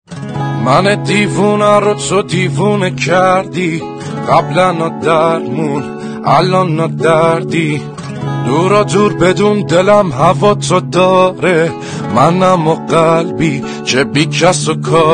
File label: Guitar